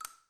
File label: Snd Shell Hit5; Red Hot Vengeance